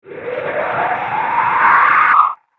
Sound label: Space Suction, science fiction sound effect